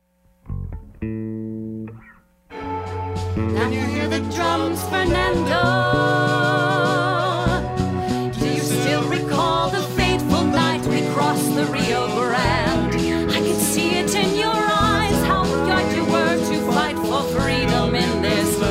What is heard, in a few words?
Tribute Band; Seattle